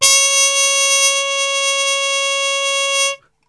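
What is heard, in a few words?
C#3, Brass, trumpet harmon mute; Best Service; Brass Super Section; TRP HARMUTE; 90 s Sample CDs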